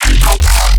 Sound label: bass hit combinator F; Virtual Riot